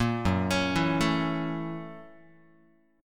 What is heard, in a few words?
Gb 7th Sharp 9th Gb Guitar; Listen to Gb7#9 arpeggiated